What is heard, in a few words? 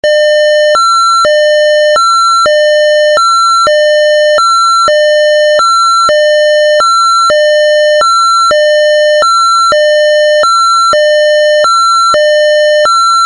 Siren Tone HILO; UNITROL; Pathfinder